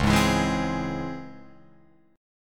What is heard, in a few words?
Db Minor Major 7th Db down Guitar Open F; DbmM7 strummed; DbmM7 chord; JGuitar